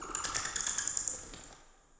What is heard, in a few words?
bat; dota; ambient; soundscapes; diretide